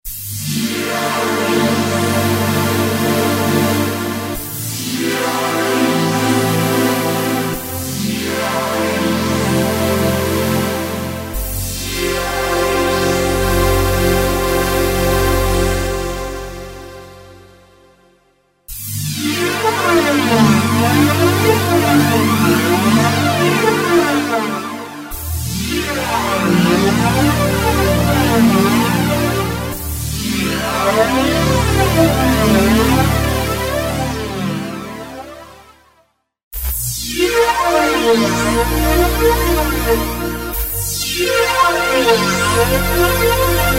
v-synth sweep pad

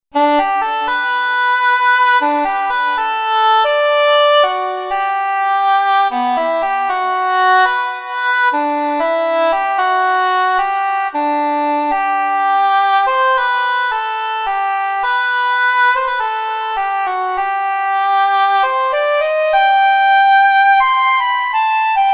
S Sax, v-synth

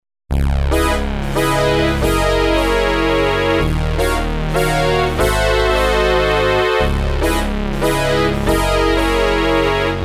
synth brass

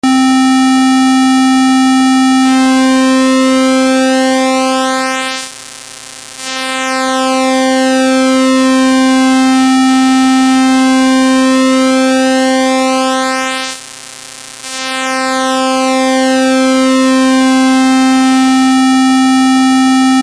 v-synth sqr

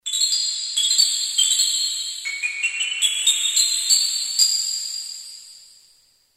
resonance; moss

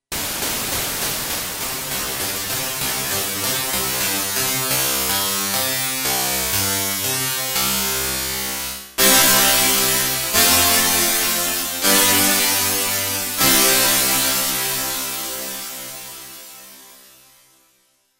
comb; moss

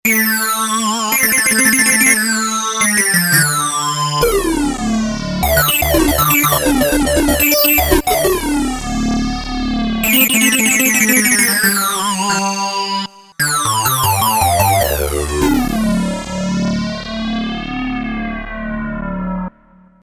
hard synth lead; z1; moss

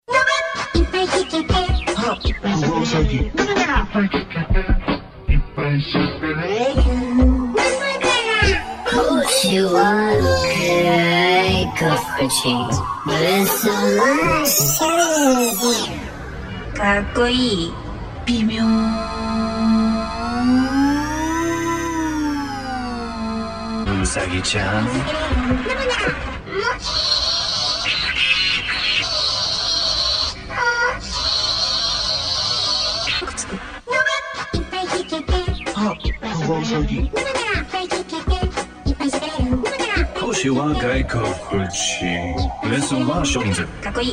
v synth

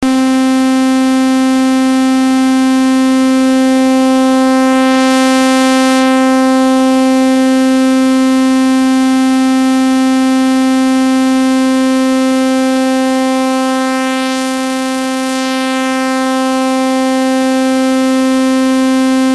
v-synth ramp

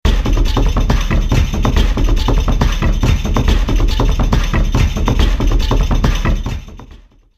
loop moto; v-synth

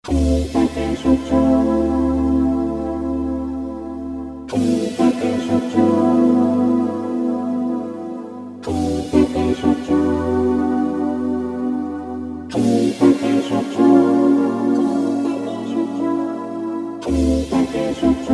v-synth

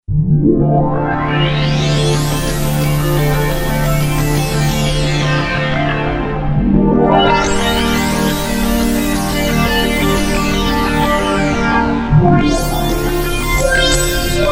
resonance; moss

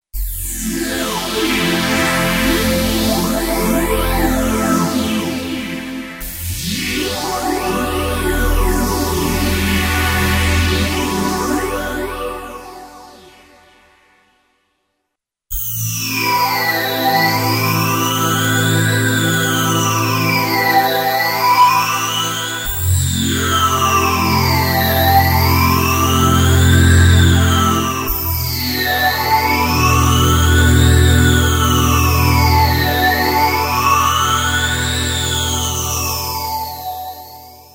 v-synth phaser

